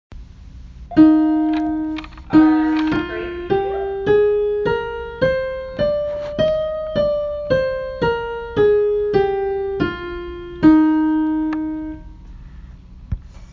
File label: eb scale